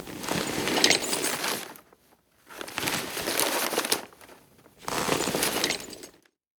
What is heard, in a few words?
hermit idle; LoL; emitters map12